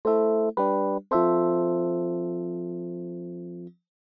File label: Electric; Creating Great Sounds in your DAW